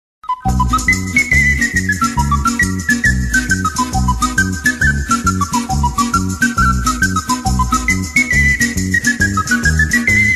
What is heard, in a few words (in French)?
Tarentelle Sicilienne, Italie - Sicile